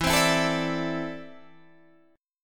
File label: E 7th Suspended 4th Sharp 5th, Guitar, DADGAD